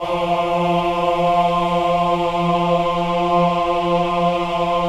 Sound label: VIB F3, VIBRATO, Voices; Spectrasonics